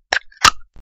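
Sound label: can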